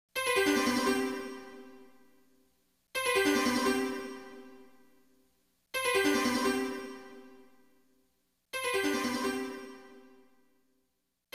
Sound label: Midi; Salamander Portable; PSP; Xexex